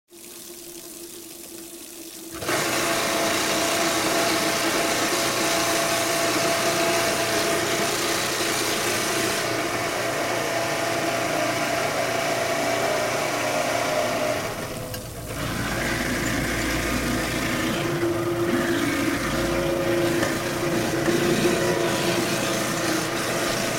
Garbage Disposal Turn on & Grind w Running Water Then; Household